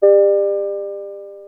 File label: gong